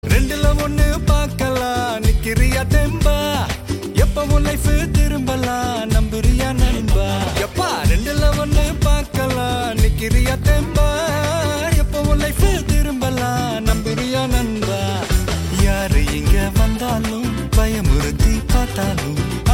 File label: Starting Ringtone